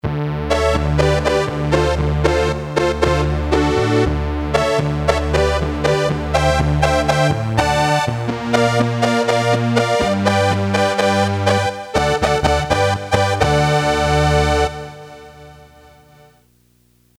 Brass Ens, Casio CT-370; Tones

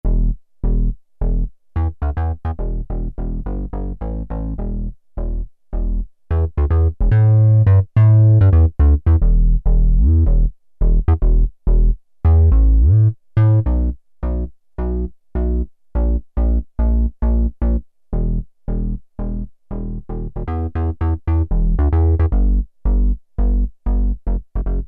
BASS, Oberheim OB-8; Factory Patches

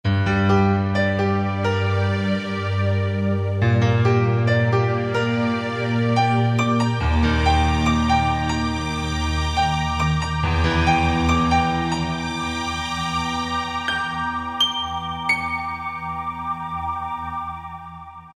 Piano Morph, Alesis QS8; Factory Preset examples

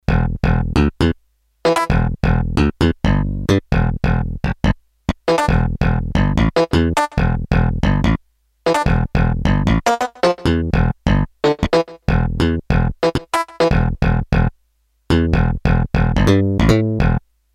Funky Slapping; Factory Patches

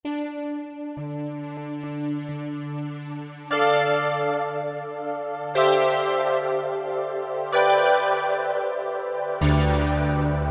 Yamaha TG500; SC Anti

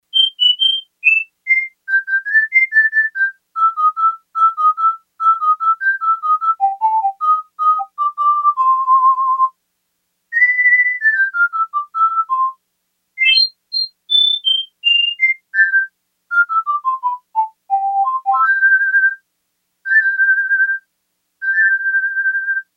Piccolo; Yamaha TX802; Factory Patches; Voices

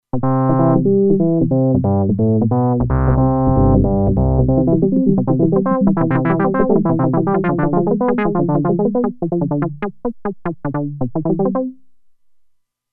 Arpeggiator, Roland Alpha Juno-2; Original Sound Chart patches

